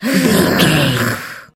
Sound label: voice female growl hiss